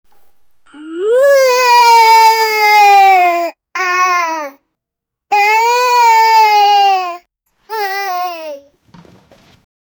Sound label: child happy